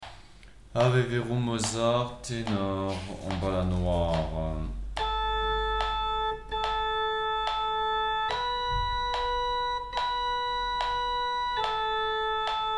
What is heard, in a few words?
tenor; Mozart; Ave verum